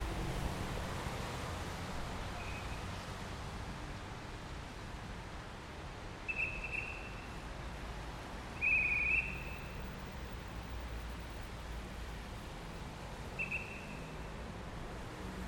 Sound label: Paris Police Directing Traffic with Whistle; Police Officer Directing Traffic; Sirens and Crosswalk Signals